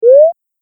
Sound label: Collect Item Pick Up Sound, video game sound effects